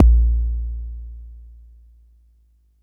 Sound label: Bassdrum, Jomox Xbase09